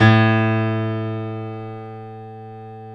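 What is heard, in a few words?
grand piano; B-GRAND PF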